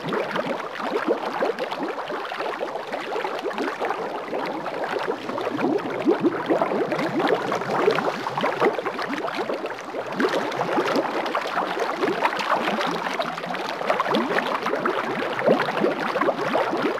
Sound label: Sharkwing Stationary Bubble Loop, Vehicles; Warframe